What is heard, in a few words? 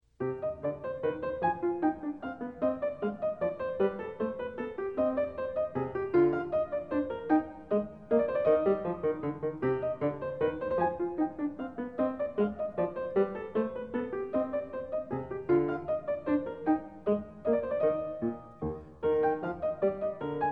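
menuett, piano; bwv 813; French Suite 2; BACH; FRENCH SUITES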